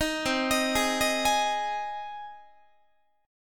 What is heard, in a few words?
C Minor Sharp 5th C down Guitar; arpeggiated; JGuitar